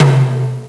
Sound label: tomtom